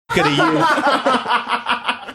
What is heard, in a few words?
having good laugh